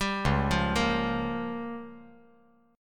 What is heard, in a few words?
Db 6th Flat 5th Db down; Db6b5 arpeggiated; JGuitar